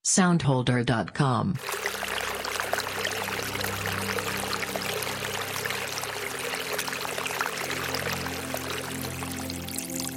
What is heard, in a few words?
water flow sound fx